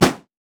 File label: jack in the box basic atta; jester